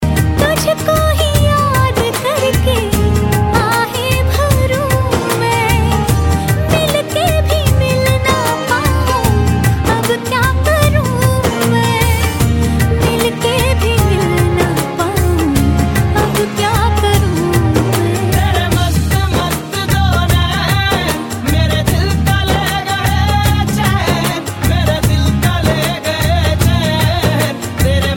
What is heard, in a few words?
Female; Dabangg